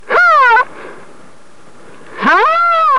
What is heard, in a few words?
rhinos